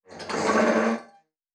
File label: Metal Foley Creak; Sci-Fi Sounds